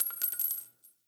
Shell Casing; Target Practice; Warframe; Nineteen Ninety Nine; Quests; Quincy

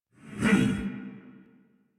Attack Effort; Warframe; Dax Heavy; en

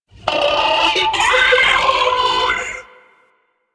Sentient Ghost Caster Pain, Barks; Warframe; DE; Sentients; Sentient Eidolon Ghost Caster

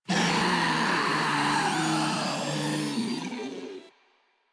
Infested Ancient Death; Warframe; Proto Infested

